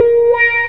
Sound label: MEOW A#, Distortion Gtr; PlatinumPhattCD2; E-MU Producer Series Vol. 8 – Platinum Phatt